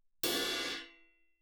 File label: 20 crash, choke crash, suspended cymbals; Percussion